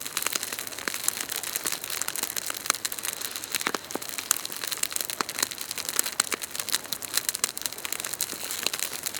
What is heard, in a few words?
Bushfire Sizzle; Boomerang Fu